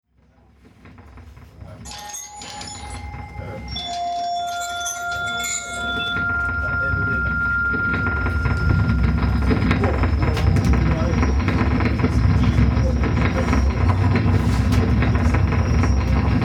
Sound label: call Dark Noise